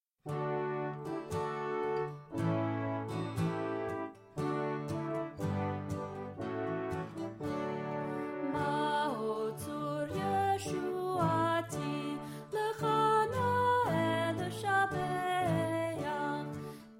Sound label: maoz tzur vocal, Children's Music; maoz tzur song